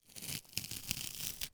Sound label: Human Shave Person Shaves With Razor Short